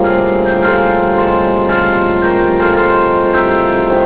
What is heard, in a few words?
carillons